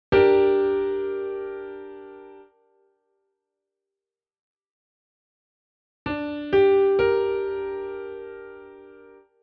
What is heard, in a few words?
akkord